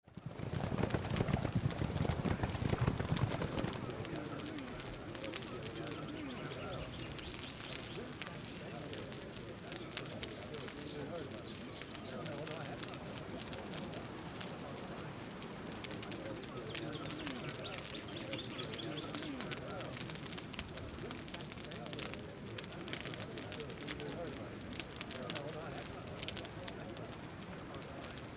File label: Ambience